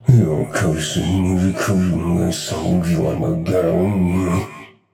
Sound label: grumble; smite; arthur